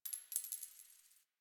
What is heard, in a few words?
Coin Drop